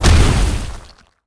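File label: Guardian attack explosion; monster; war3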